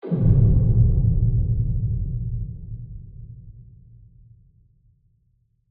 impact dark muted bass attack descend rumble dank; skyclad sound

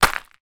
foley footstep single on gravel dirt; zapsplat

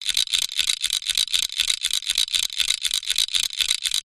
industrial metal tool wind cogs

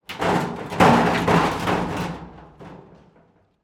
metal wave panel gate closing; london music mixing

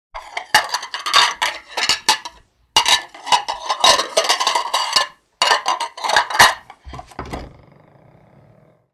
dishes piled

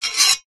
warfare sword medieval heavy draw scabbard

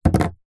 industrial duct tape roll set down wood

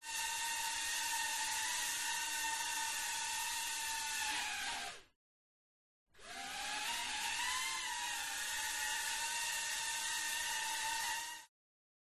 Drone DR1; fox audio

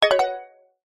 alert glassy mallet

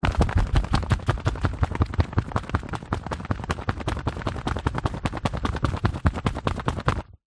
bird flap wings; animals

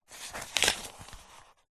household book old well read thin page turn; zapsplat

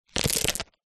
foley stones drop lightly soft surface; zapsplat

